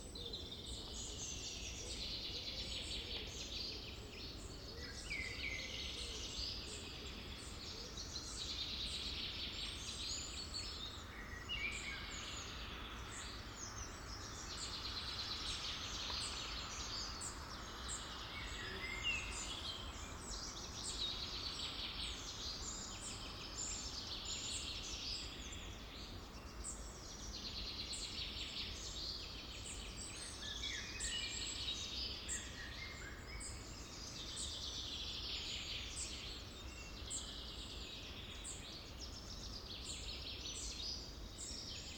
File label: Forest Car Passby